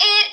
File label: vocal